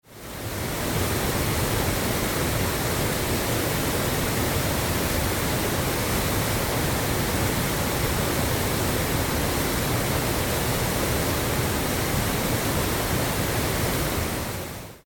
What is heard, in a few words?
a bigass waterfall